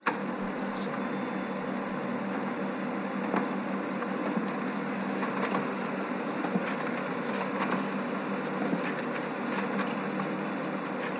printer printing; soundscrate

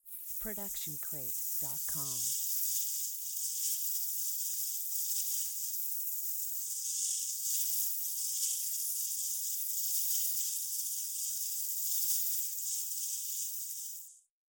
Magic Portal; SoundsCrate

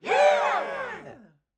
audience cheers variation

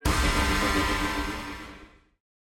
magical block; soundscrate

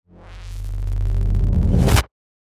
electricity impact

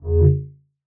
mid space ship short fly by; soundscrate

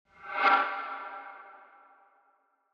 Dark Magic Movement; SoundsCrate

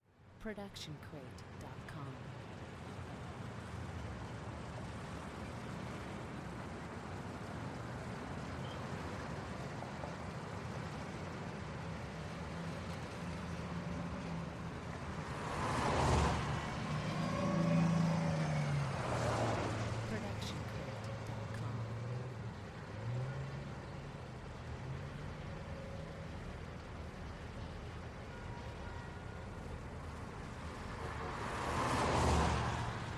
city ambience

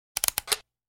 camera shutter classic

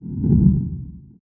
Creepy Low Woosh; SoundsCrate

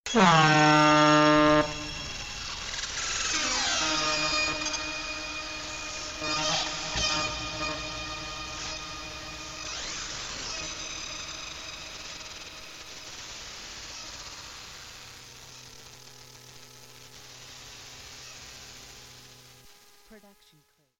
air horn designer screech scary; SoundsCrate

